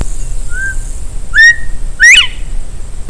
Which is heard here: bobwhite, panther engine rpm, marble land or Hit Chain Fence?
bobwhite